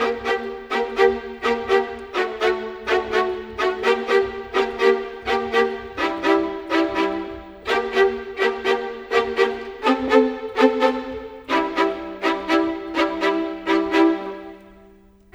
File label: Violins; Sonic Foundry (Sony Creative Software); Orchestral Series VOL-4; Rock and Pop; Rock-Pop 18